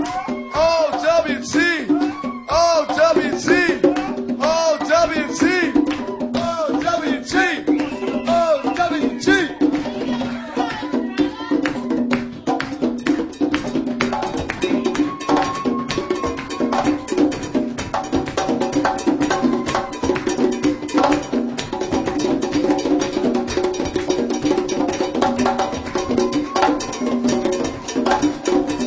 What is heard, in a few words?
drums; Live At Nietzsches